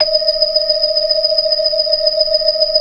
Blood, organ; Keith Emerson Signature Series

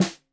Snare 65 Reg Stereo, Deeply Sampled Drums

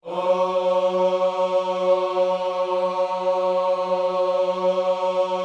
OHS F#3, GOSPEL; Spectrasonics; Vocal Planet

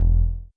Bass E, Old Skool House, 137bpm; Belfast

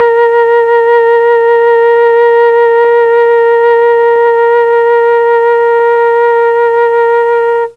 A#2 TRON FLUTE, Mellotron; Mike Pinder - Mellotron Archive CD

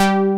OBX BASS; Pro-Rec - Synthsonic; BASSES

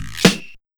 150 UK REV SD, Ragga & Dub Reggae; USB Soundscan vol.27; AKAI

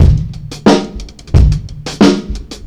JZ 90 DRUM, ACID JAZZ; Best Service; Advanced Media Trax Modern Composer

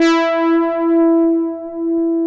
OBERHEIMS

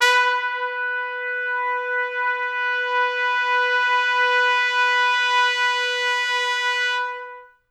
B3 -L, Pop Brass; Best Service; ProSamples vol.19; AKAI

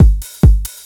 4 floor beat, trance

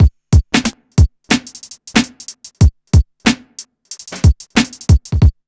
BEAT, 92, Hip Hop R n B; USB Soundscan vol.19; AKAI; TIMBA

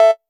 TR808 Cowbell

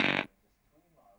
Tom Hi Speaker, Stylophone Beatbox

